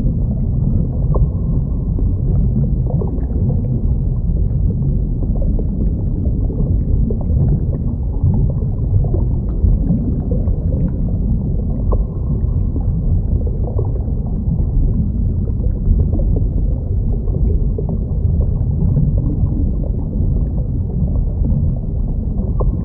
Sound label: Diving Ambience; UltimateFishing2020